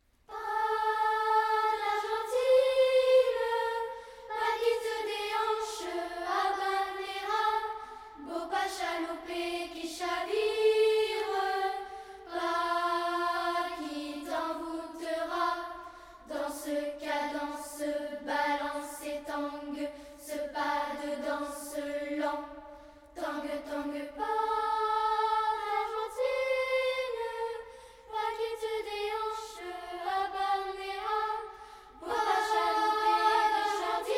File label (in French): a cappella